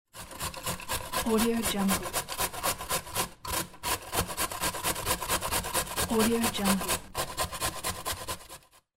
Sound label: scraping carrot